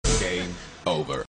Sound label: Game Over